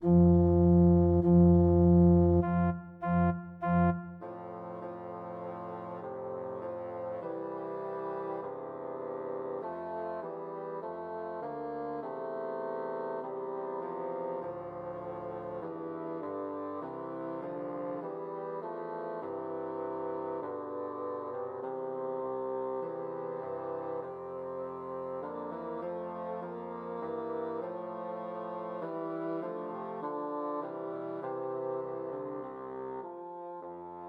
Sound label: O Come All Ye Faithful Christmas Trios for Three Bassoons